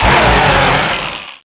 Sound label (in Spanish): Grito de Excadrill